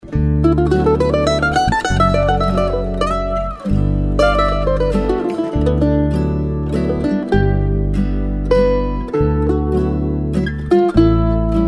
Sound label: mandoline